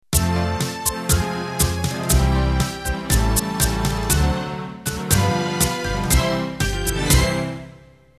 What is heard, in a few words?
Tango Ballroom End; Band-in-a-Box